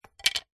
foley wood strip small thin drop or set down hard surface; zapsplat